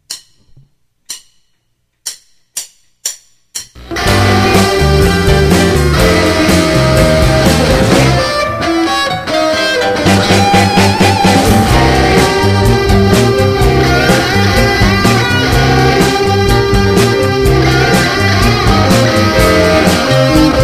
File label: guitar a funky blues